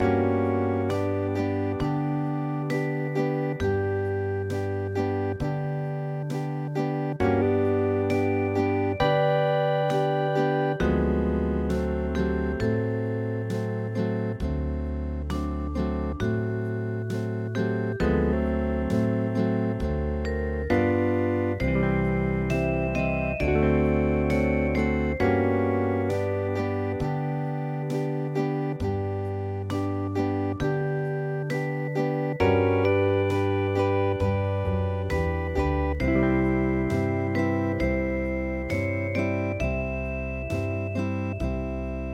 hawaian

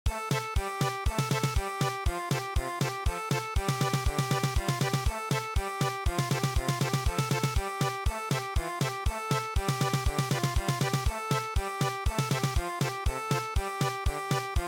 German Polka

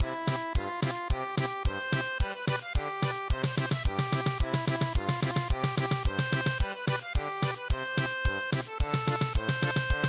youngman of tirol German Polka

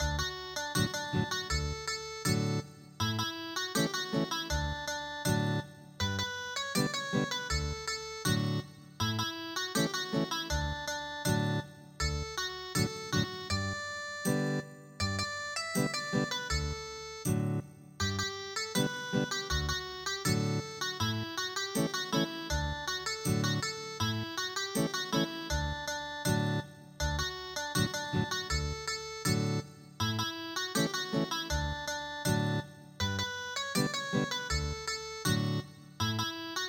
Ethnic Guitar

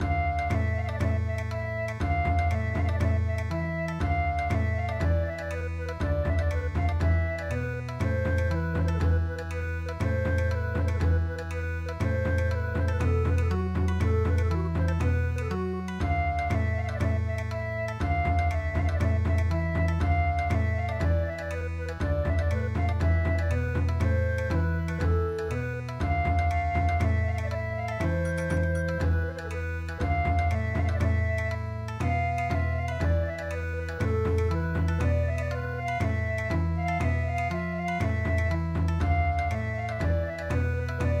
March Bon Odori

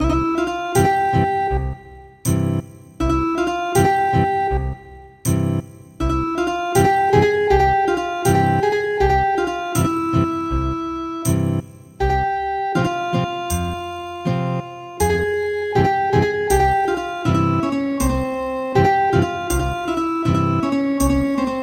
Ethnic Guitar; midi; traditional

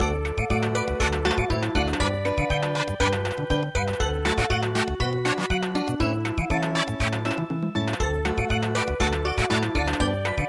samba, midi; Massas In De Cold Cold Ground

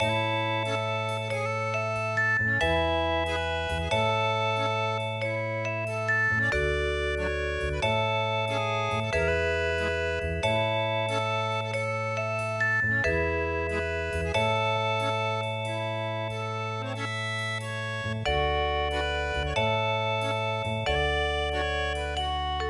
flag of the free muset

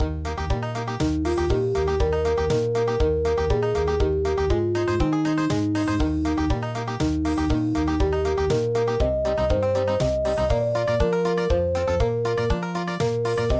country, midi; sweet betsy from pike

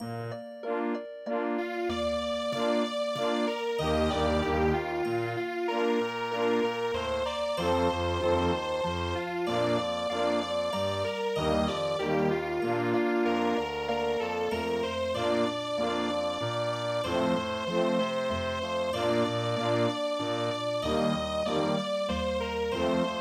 waltz; Lighthouse keeper

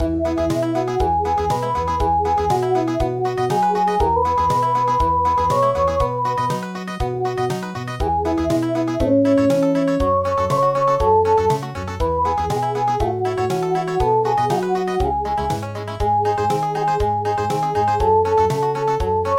Country

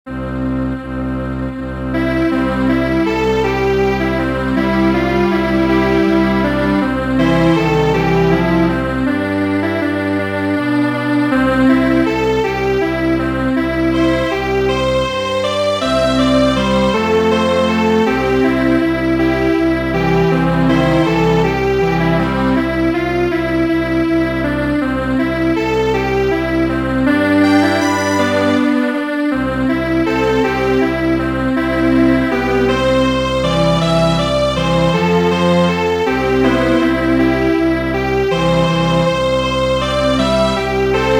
Strings Quartet, traditional; The Campbells Are Coming

